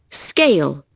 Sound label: Scale